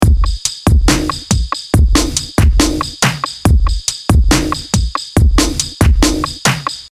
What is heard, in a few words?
Bass Tutorial Beat